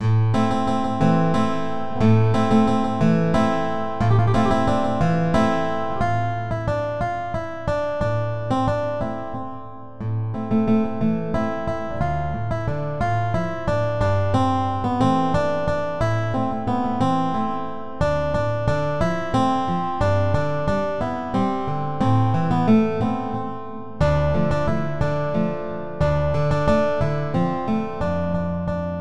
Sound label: guitar